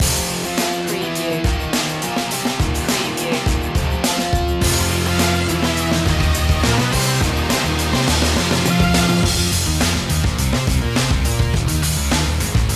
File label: rock slam